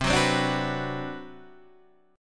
D 13th C

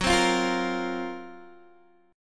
Gb Major 7th Flat 5th, down, Guitar, DADGAD

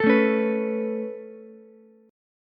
A Major Add 9th A Ukulele C6, chord; Aadd9 strummed; JGuitar

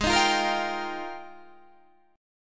F Minor 11th, guitar chord

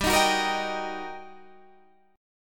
G Minor Major 7th Sharp 5th, G, down; GmM7#5; strummed

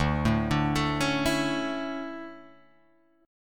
D Major 7th Suspended 2nd Guitar Drop D, chord

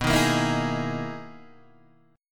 B Major 13th B down Guitar DADGAD

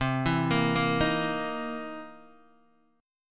C 7th Sharp 9th, Guitar, DADGAD

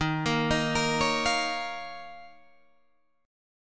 Eb 7th Suspended 2nd Eb down Guitar DADGAD; arpeggiated

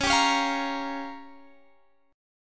G Minor 7th Flat 5th

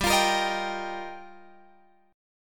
G Minor 7th Sharp 5th, Guitar, Drop D

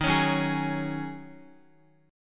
Eb Minor 11th Eb down Guitar 4F,4A,5C,5G,5C,6E; Ebm11 strummed; JGuitar

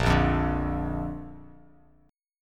A sharp Major, down, Bass Standard; strummed; JGuitar

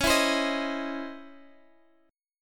Db Minor Major 9th Db Guitar Half Step Down

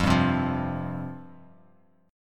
F Suspended 2nd F; Fsus2 strummed; JGuitar

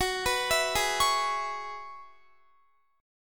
Gb 7th Suspended 2nd Suspended 4th, Guitar; Gb7sus2sus4 arpeggiated

